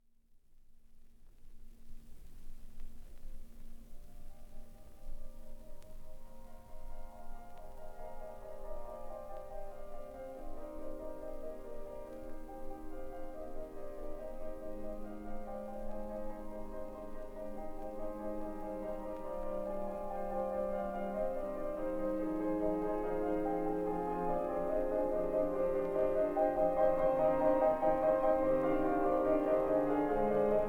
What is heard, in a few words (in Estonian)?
Improvisatsioonid